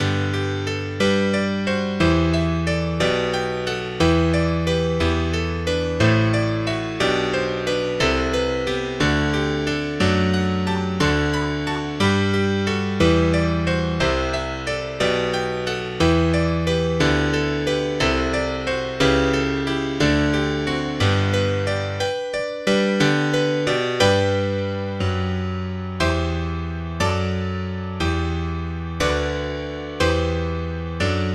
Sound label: bright yamaha grand